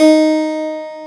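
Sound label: harpsichord A3, keyboards; Roland LA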